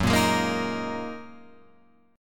Gb 6th Add 9th Gb down Guitar Open F; strummed; Gb6add9; JGuitar

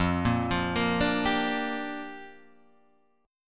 A sharp 6th F

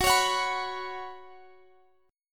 Gb Suspended 4th, Guitar, Standard; Gbsus4; strummed; x,x,16,18,19,19; down; capo=3

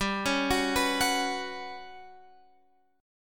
G Major 7th Flat 5th

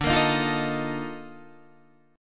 Bb Major 11th D

